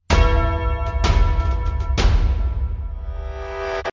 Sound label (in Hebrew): פתיח